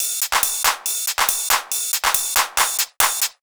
Loop 140 BPM; Vengeance Samples

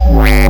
Synth Bass; Vengeance Samples; VEE Synth Shots

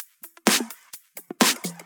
Electro Loop 128 BPM; Vengeance Samples

